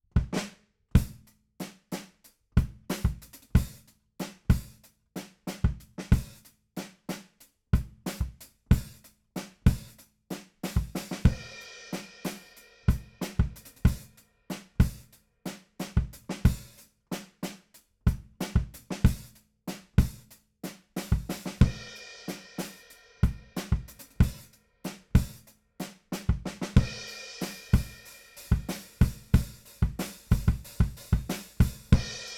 BD Out

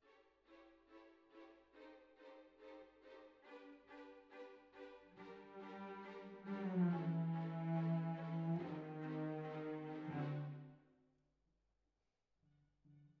Cbasses

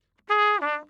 Trumpet

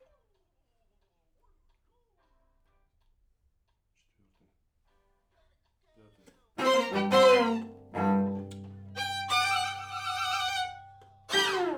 Main Strings, RNR for piano

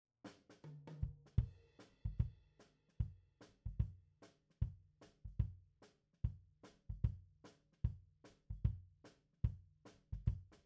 Kick out, Ragtime

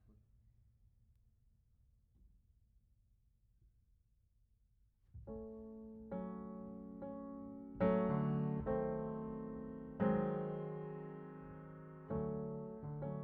Piano Mono

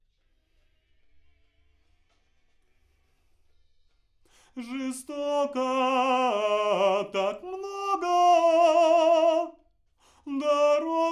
Backs AKG RIGHT

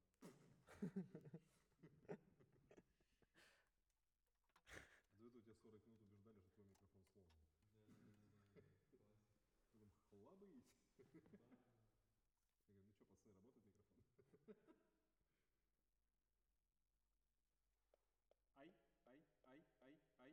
Alto, RNR for piano

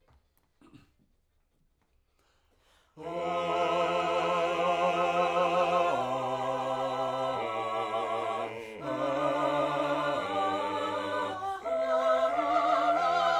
Backs SCHOEPS

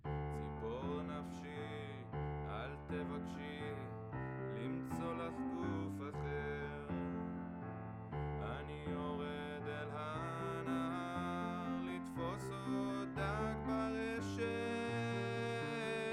Lead B

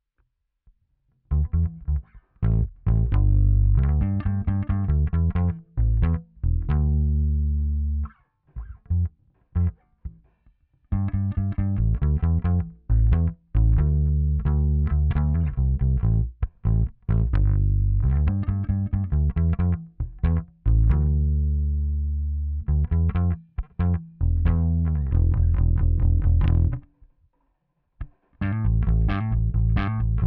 Bass Amp

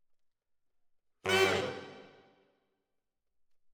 ORTF SCHOEPS, piano

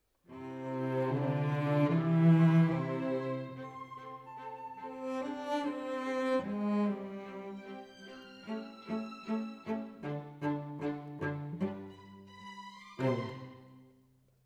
Cello Solo

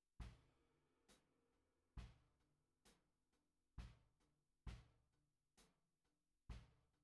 SNR Bot

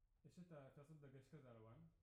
Piano B; Piano Workshop Rec

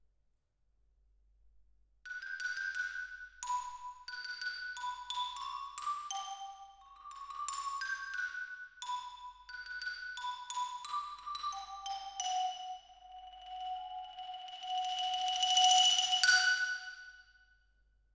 Xylo

